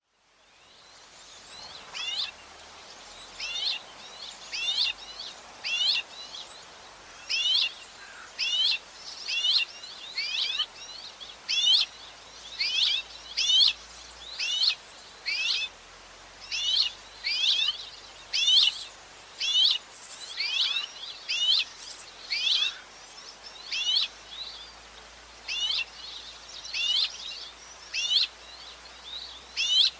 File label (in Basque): harkaitz txolarrea, Gorrión chillón, Petronia petronia, Canto; Observatorio de aves